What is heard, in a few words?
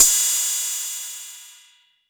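TR606 Cymbal, Roland TR-606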